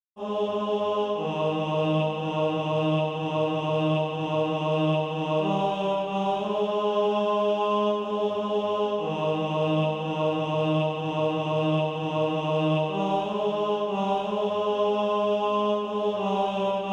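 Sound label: tenor, Practise Music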